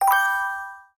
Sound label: SFX Success